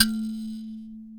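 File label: A2 mbira left; WorldSounds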